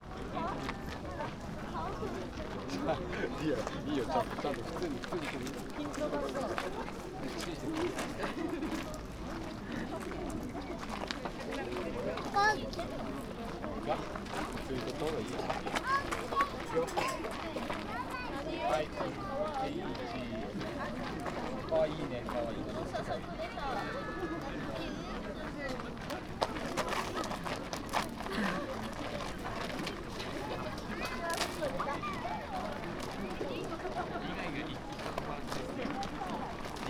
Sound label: Fukushima Soundscape: Mt. Shinobu, parking night; 護国神社前の駐車場; 夜になっても，満開となった信夫山の桜を見に護国神社前の駐車場の花見会場を訪れる人は多かった．; 平日（木曜）の20時過ぎであったが，まだ，子連れの花見客も居た．